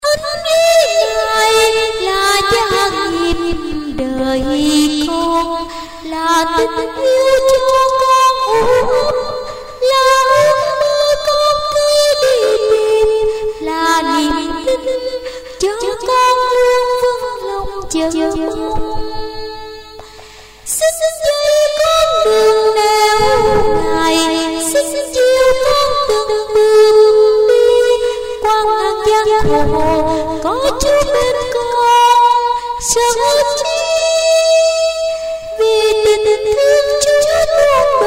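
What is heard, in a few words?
tenor soprano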